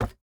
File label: phys impact wood; Rust